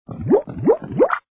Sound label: next level sound; bubble